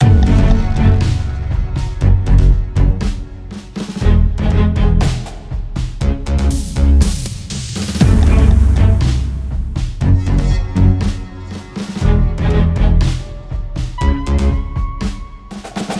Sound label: New Boss